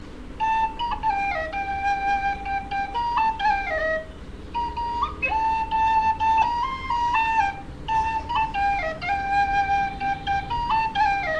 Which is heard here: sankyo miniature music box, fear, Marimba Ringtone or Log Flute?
Log Flute